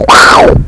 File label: Cheetah; Safari 2.4.2